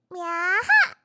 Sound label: meow, Catgirl; Catgirl Fighting SFX